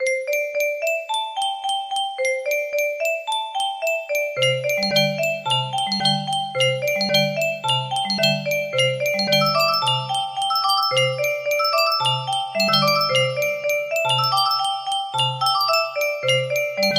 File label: C D E G A, music box melody